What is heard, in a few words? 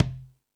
Latin Drums, E-MU Pro-Cussion; drum machine